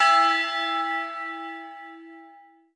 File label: orbit tubebell; Sky Children of the Light gamerip